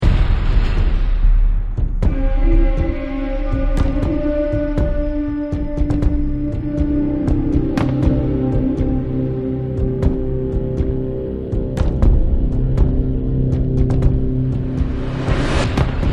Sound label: ambi quiet